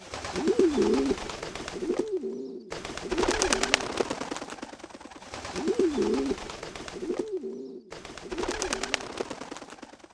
pigeon; quake2; cherbourg

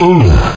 fall; quake2; morticus

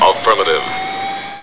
jump; quake2; robot

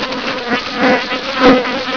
fly; quake2; kitsune

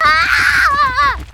pain; quake2; buttercup

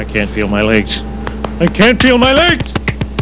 fall; quake2; homer1 4

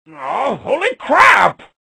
pain; quake2; strongbad

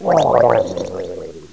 drown; quake2; hunter

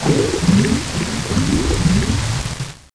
drown; quake2; link

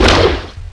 fire, grapple; quake2; chaos

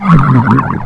death; quake2; sorb

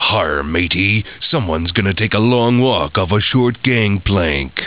death; quake2; duke3dmodel

